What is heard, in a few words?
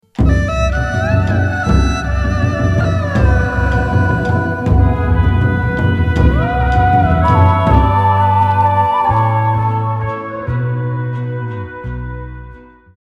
Intro sound